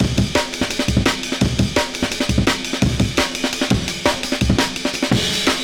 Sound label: amen; DARKMIND